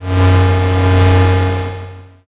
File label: space engine